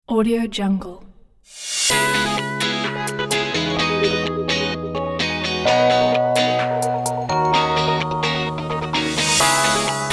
abstract ambient kit

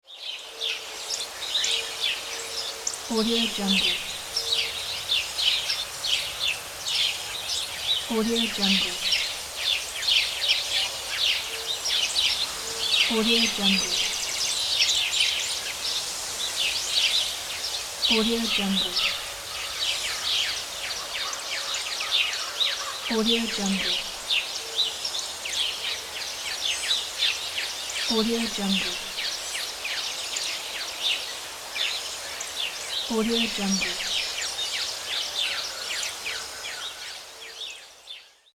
nature

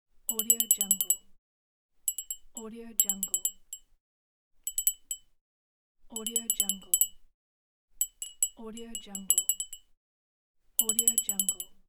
bell small

